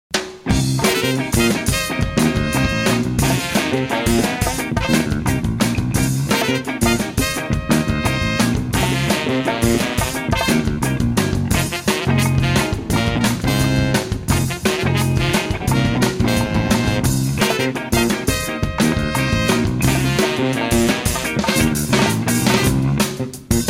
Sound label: trumpet; New Orleans Funk/Fusion Band